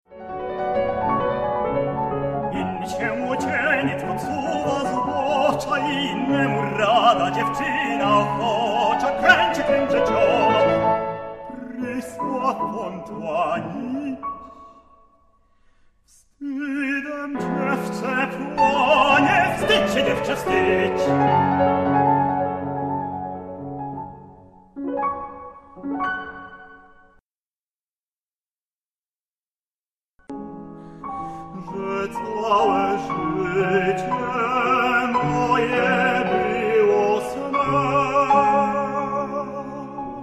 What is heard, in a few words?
polish songs